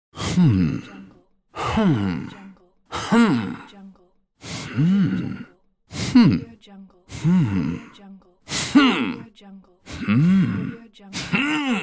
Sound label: hmm male voice